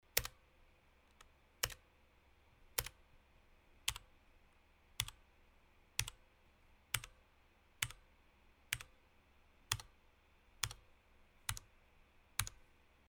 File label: Weak, keyboard; hyperx alloy elite keyboard; review hyperx alloy elite keyboard